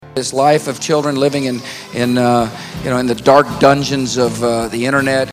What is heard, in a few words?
MUSIC dark dungeons